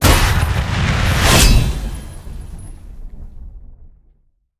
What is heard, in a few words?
scatter Lvl 2; conan